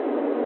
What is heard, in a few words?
skating; skater